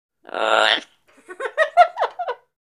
Macaw Vocalizations. Deep, Throaty Bird Calls, Almost A Laugh. Close Perspective.